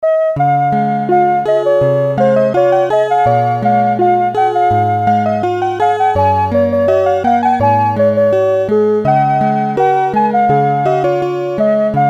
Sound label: rhythm song, clocks